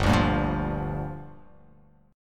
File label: G Minor 7th